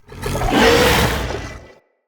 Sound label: Corrupted Battle Dog Charge Start Bark; Animals; Vocals